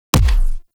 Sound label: Quick Draw Punch; weapons; Warframe; The New War; Omicrus